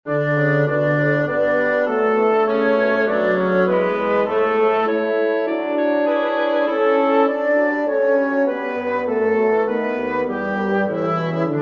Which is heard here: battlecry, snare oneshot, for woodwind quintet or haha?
for woodwind quintet